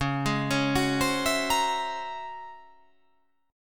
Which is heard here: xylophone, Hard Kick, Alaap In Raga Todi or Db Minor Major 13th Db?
Db Minor Major 13th Db